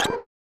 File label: Dog; Super Double Yakuman 2; SNES